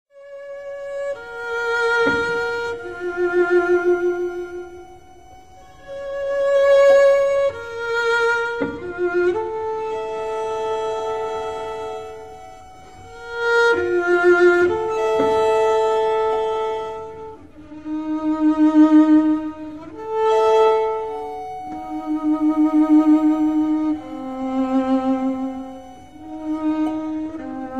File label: for piano violin cello Molto tranquillo; Mamlok; Panta Rhei (Time in Flux)